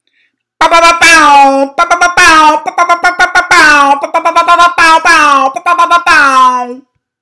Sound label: airhorn organic; woody